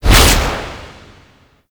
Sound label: Infested Hard Drive Cudgel, melee attack; Warframe; Infested Lich